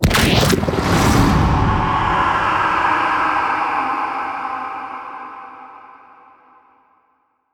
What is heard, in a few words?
Transference; Operator; Warframe; Sacrifice; Umbra